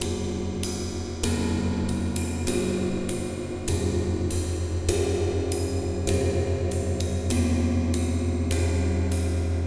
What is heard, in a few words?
Wind Gong Cymbal Brushes, Ambient Metals; Sonic Foundry (Sony Creative Software) - Ambient Atmospheres & Rhythms